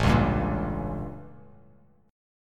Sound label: A 7th Flat 9th; A7b9 strummed; JGuitar